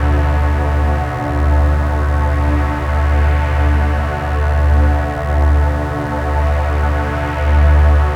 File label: Analog Zen Drone; Drones & Themes; Sonic Foundry (Sony Creative Software); Numina II